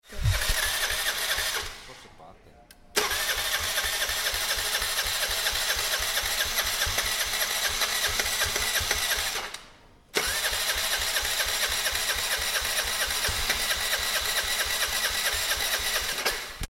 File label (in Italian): accensione